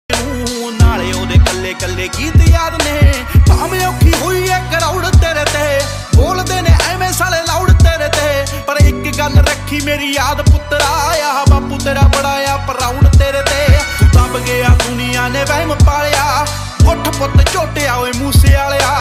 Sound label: punjabi song